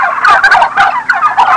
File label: Turkeys clucking